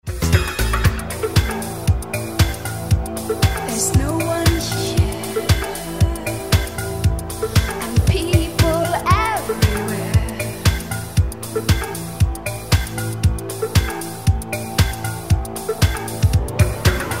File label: Crying, roland d-50